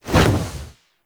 Wall Latch End, Foley; Warframe; Characters; Tenno

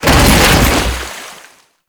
Bodyfall Water, Foley; Warframe; Duviri; Dax

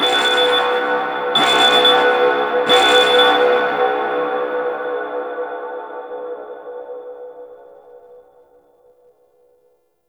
Alarm; Warframe; Tau; Cinematics; LastBreath

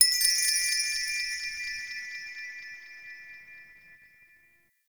interior orbital station chime; Warframe; Tau

